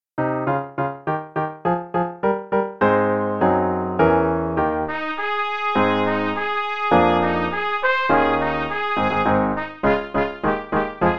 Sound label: March of the Tin Soldiers (no click, qn=104) no dynamics, trumpet; The Really Easy Trumpet Book